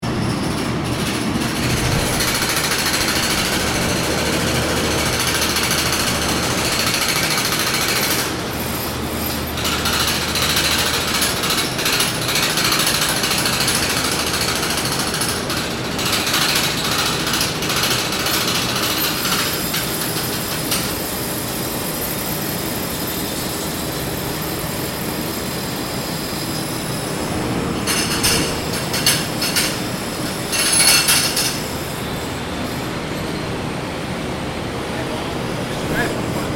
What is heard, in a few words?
jackhammer